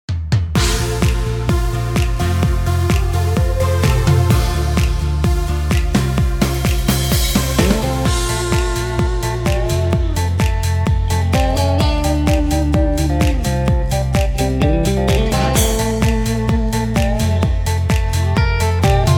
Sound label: Background Vocals